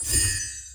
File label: enchant